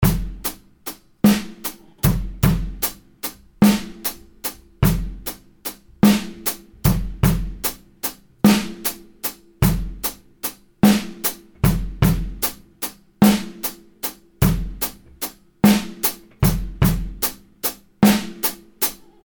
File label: drum loop 150 bpm 6 8